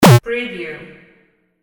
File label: button